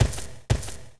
Goblin Swordman Walk; Seer; GamigoNA

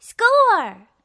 Get Point; KQ Spring

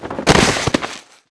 Die

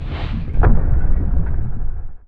die

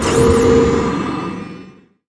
Light Orb

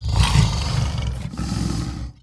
Torturer Idle

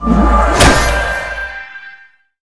Deep Fire; Seer; GamigoNA

